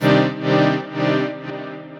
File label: Hard Chord Sidechain, Synth Keys